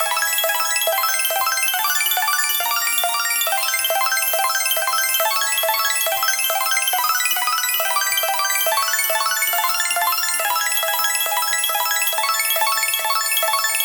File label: cash stack reel spin; vegas night life